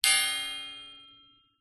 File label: impacts metal pole or thin bar hit resonate chime